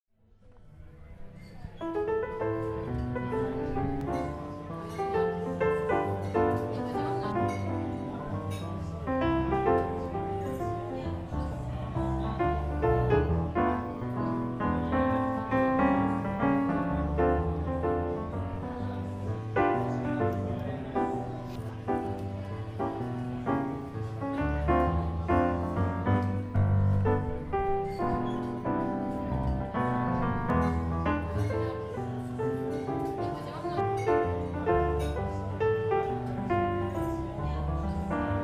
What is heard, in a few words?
(solo piano)